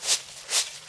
Scythe; Farm Mania

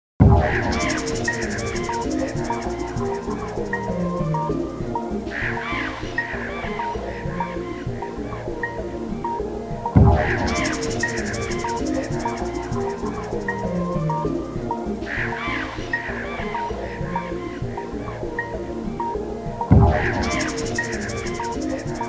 Meditation; eastern mind chu teng macos windows gamerip 1995

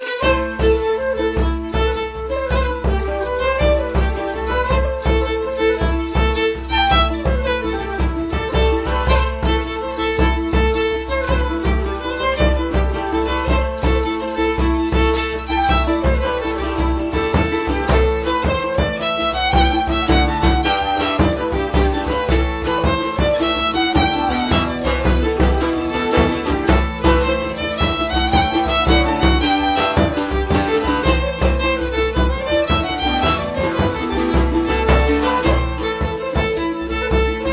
Orchestra Ambience; assassin s creed iii

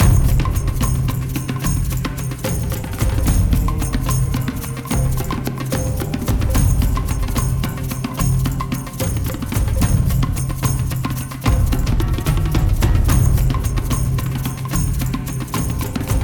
Garden Main

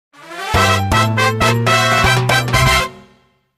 Course Clear; New Super Mario Bros. 2; 3DS

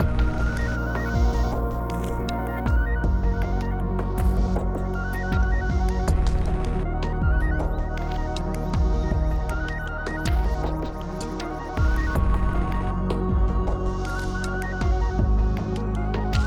Family Puzzle 8CH 79BPM

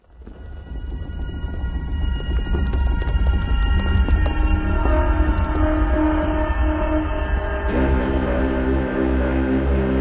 Jingle Tree; Year Walk

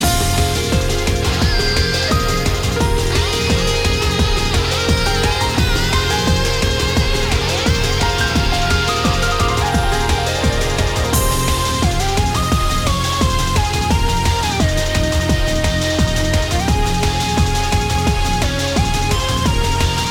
bgm battle; Sengoku Otome Legend Battle; PS Vita

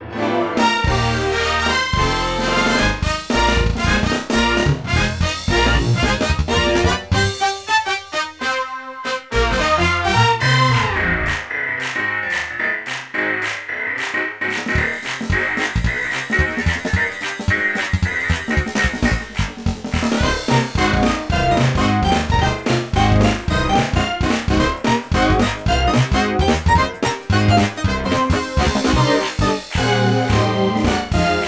Factory ~ Machines Section (Hit By Lightning); Mario Kart Wii

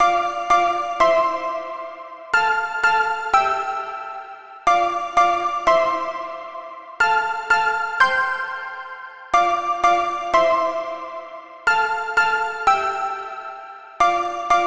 Pluck Melody; super crush k.o.

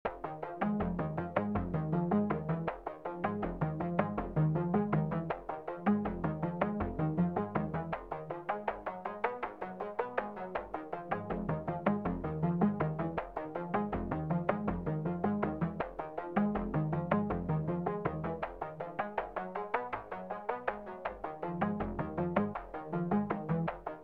Bass I; risk of rain 2 engineer edition 2

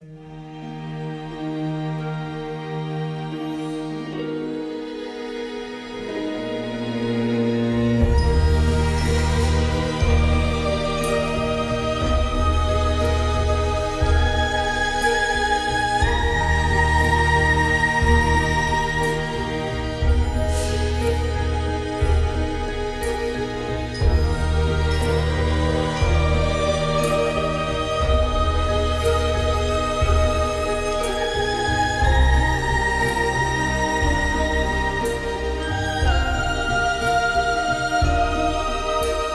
Underwater Universe; Ylands

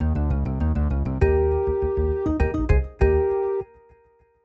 end stinger; SpongeBob Patty Pursuit

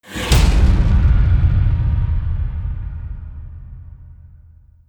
death stinger; Iron Tides macOS Windows gamerip 2017

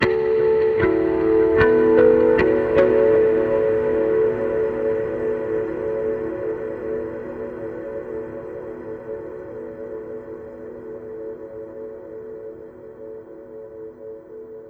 Overlay Tone

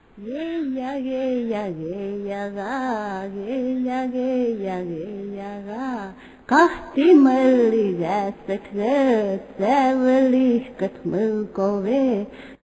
Radio Song; Smeshariki Museum of Communications

